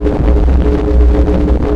beam loop red; Dystopia; injection

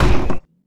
heavy wood, footsteps; player; heavyfeet; Dystopia

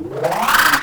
turret spin up; Dystopia; weapons

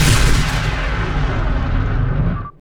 fire, plasma, weapons; Dystopia

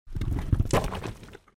short rock; Dystopia; meatspace

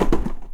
cardboard soft, physics; Dystopia

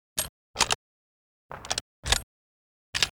reload; weapons; Dystopia; ar2

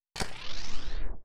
laser rifle draw; Dystopia

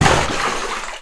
water impact; Dystopia; physics

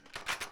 shotgun draw; weapons; Dystopia

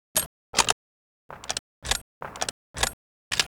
dual pistols reload; Dystopia; duals

